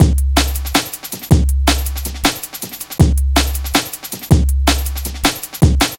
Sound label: 160, Drum Loops, Drum & Bass; Total Drum&Bass